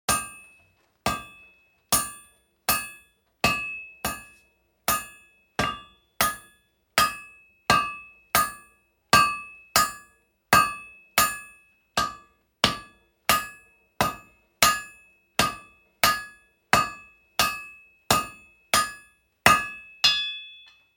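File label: sounds in a forge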